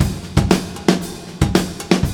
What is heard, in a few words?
break; Zero G - Man Machine